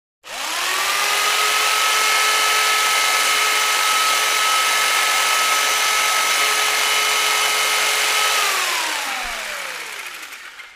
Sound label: Jig Saw Idl Run